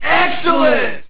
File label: end level; pang